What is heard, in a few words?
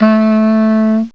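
clarinet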